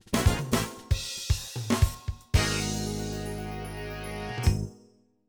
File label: Game Over; marvel vs. capcom 2 new age of heroes naomi 2000 dreamcast gamerip; (Naomi) (Arcade) (gamerip) (2000)